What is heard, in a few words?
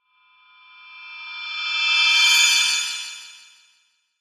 Shimmer Crystal Ring Delay, High Frequency Feedback Distortion Descend; High Tone; Blastwave